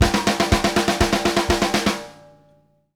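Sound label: snare lick + kick, drum fills; Sampleheads - New York City Drumworks VOL-1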